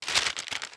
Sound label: Letters Read